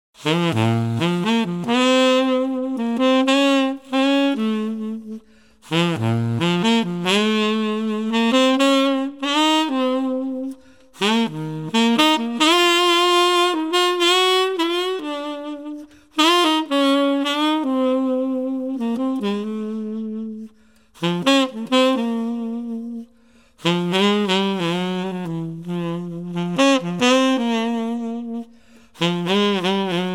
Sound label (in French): saxophone